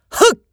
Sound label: attack, voice; jakigan